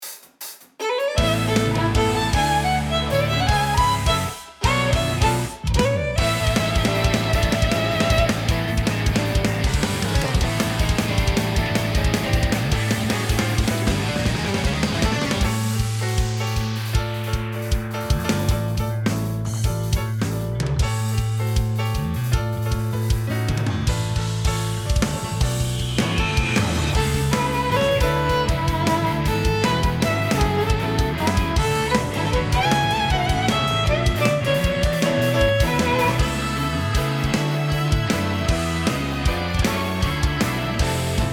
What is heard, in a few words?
backing track; G.O.D. COMPETITION素材